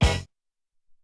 Tek Hit

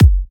F# kicks; markus hakala kicks

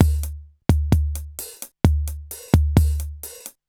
Alesis HR-16B (130BPM), beats; musicradar; cyborg beats

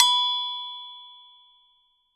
Agogo; Polyend; Simulacrum Sounds; 1 Shots; Acoustic and Electric; Drums

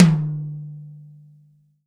tom, Real Drum Kit, funk kit

